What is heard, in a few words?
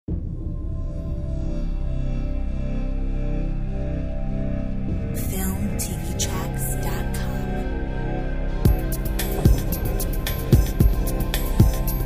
The drone attack